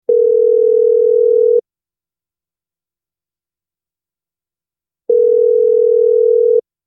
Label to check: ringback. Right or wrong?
Right